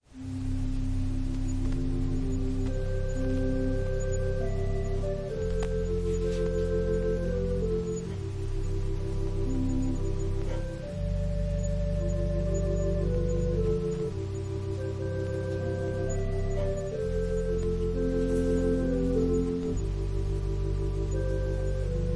Organ prelude

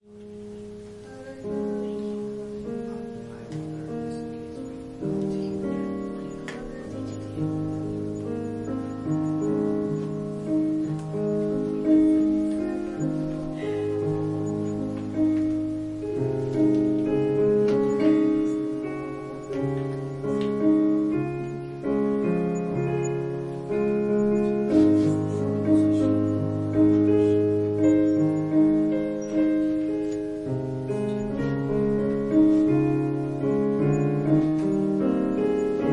Piano prelude; Youre a blessed mess

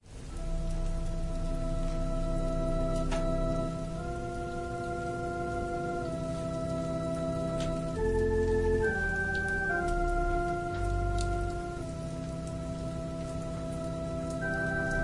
Organ and violin prelude

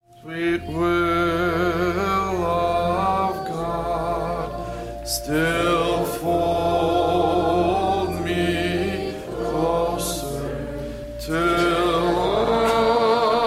chorus and verses; Sweet Will of God; verses 3-4

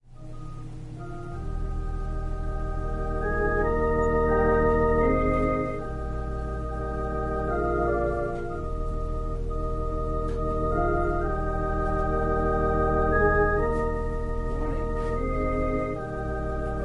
Organ prelude

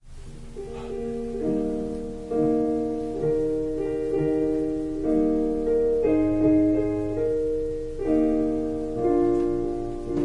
Piano prelude

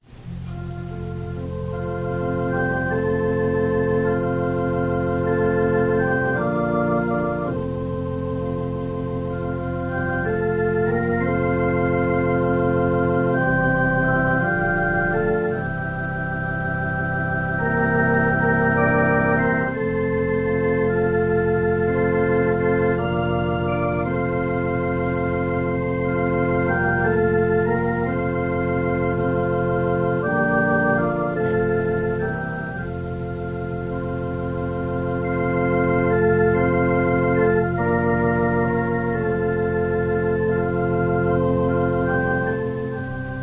Organ prelude

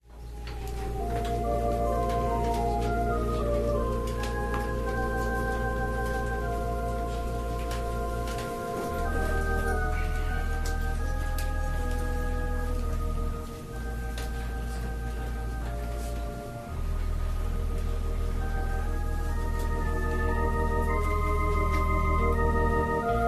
Organ and violin prelude, praise

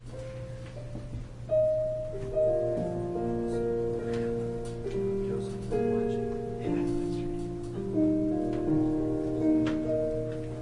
Piano and violin prelude

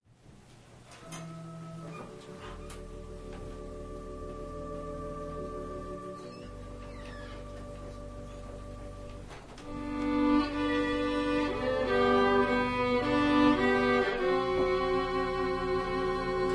Organ and violin prelude

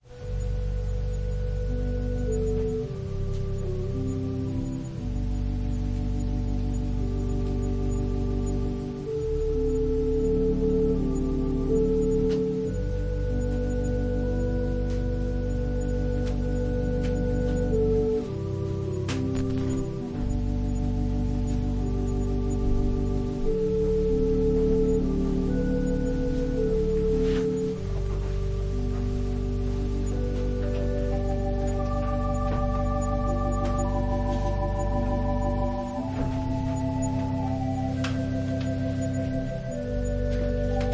Organ prelude

